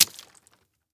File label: wet hit small object drop